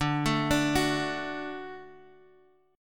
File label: D Major, D; D Chord {X X 0 2 3 2} arpeggiated